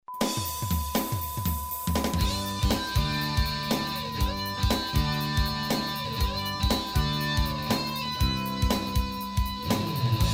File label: Bassman Sound Works